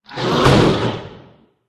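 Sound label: boss squid attack voice mid; kalmar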